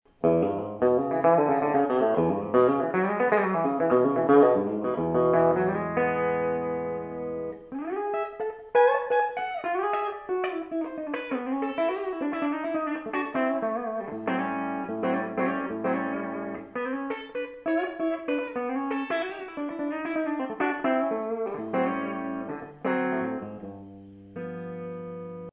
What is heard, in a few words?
EG1 bridge, guitars